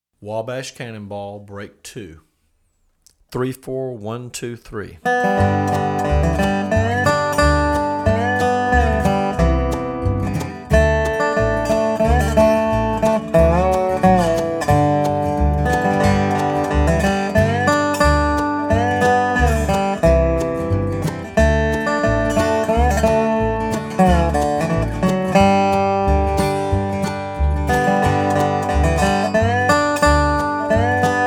Fast; Wabash Cannonball

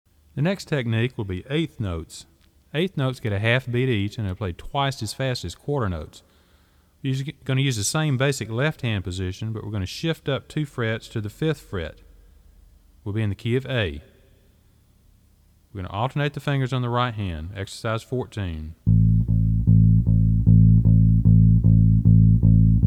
Eighth Notes

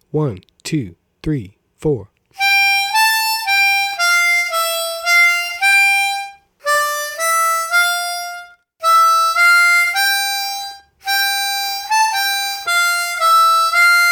Fast; London Bridge

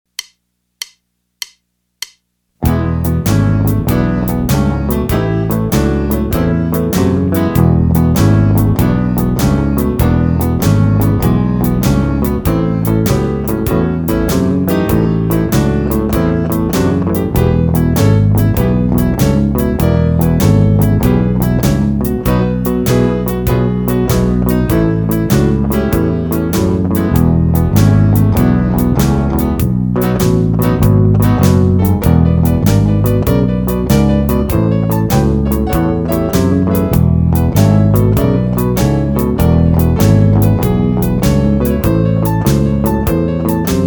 12 Bar Blues In E Fast

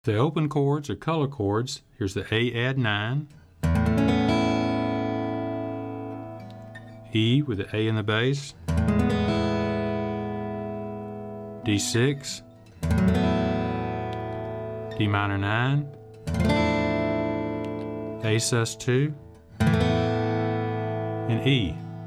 Open Chords And Color Chords

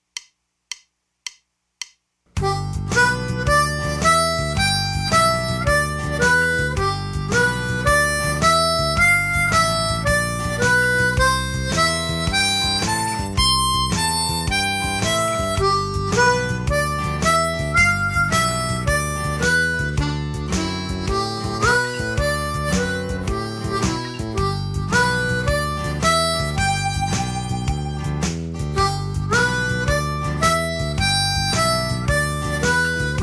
Harp Boogie Fast

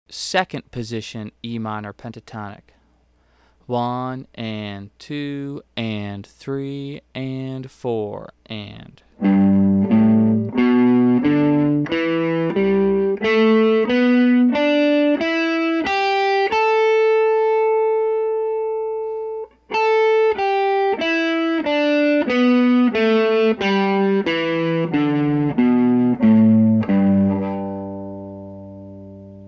Em Pentatonic 2nd Position

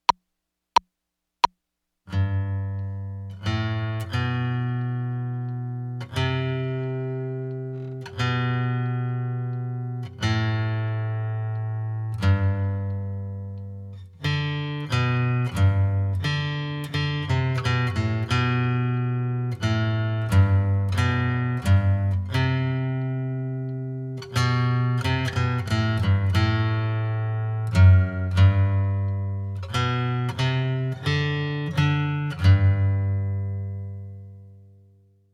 2nd Guitar; Minuet In G

